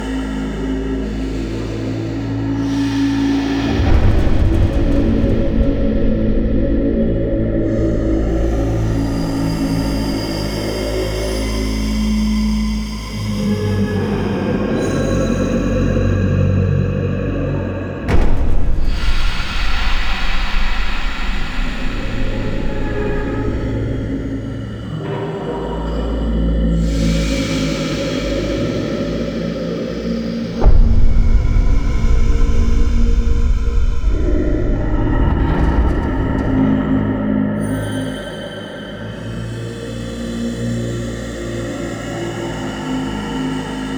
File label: Interior Vents Bed Loop, Ambience; Warframe; Entrati